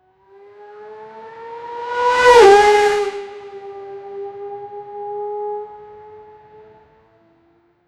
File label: a quick sharp recording o, sportscar